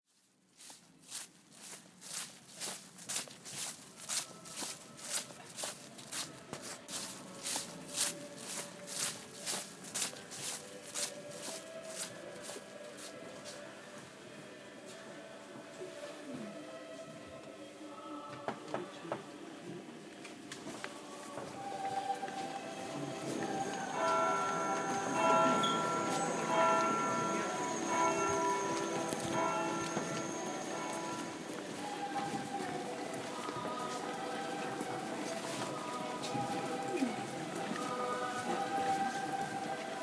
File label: more bells